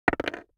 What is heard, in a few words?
DICE on Hard Wood, Throw and Roll, Metal, 1 One Die; Samples; ArticulatedSounds; DICE Sfx Party (Extended)